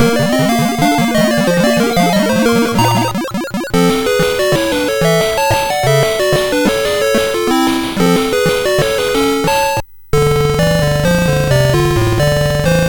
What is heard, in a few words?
Musicbox, MOS6581R4